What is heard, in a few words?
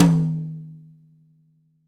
Jazz Hi Tom